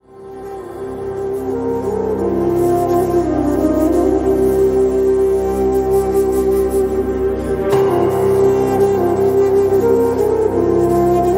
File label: Female Version Flute BGM